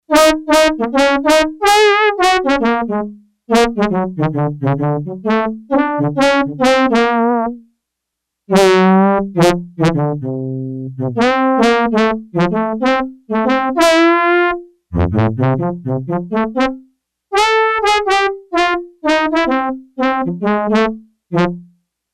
Trombone, Yamaha TX802; Factory Patches; Voices

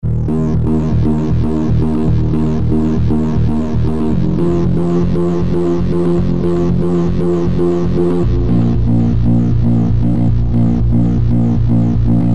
Basso, Basses; Green Oak Crystal

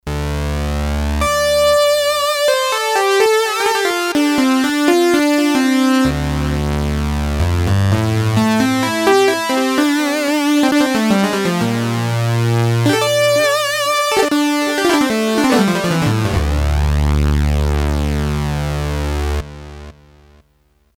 M-saw Lead, M-Audio Venom

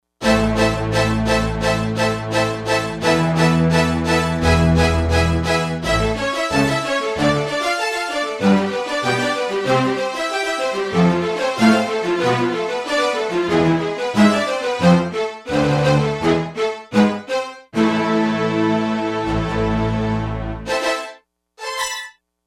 Fast Strings; Digital Synthesizer

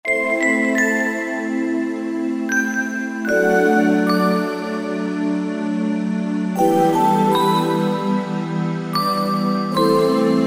Solar Bell Pad, Korg TR-Rack

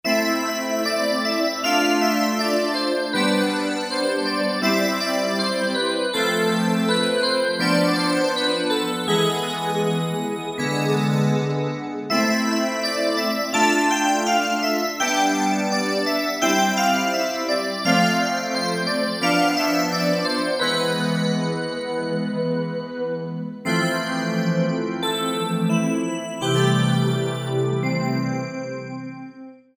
Filligree, Korg MSC-2S Synth combination; Synth 1

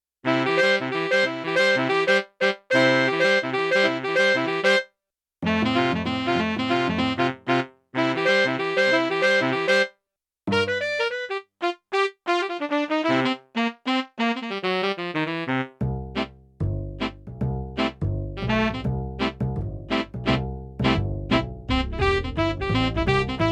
Big Band Brass; Yamaha TX16W; Orchestral Performance 2

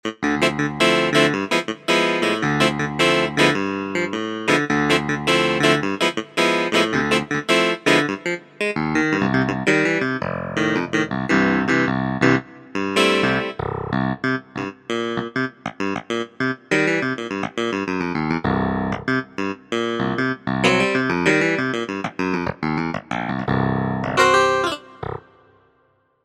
CLAVINET; Universal Sound Bank; Soundscan 09 Old School Keyboards

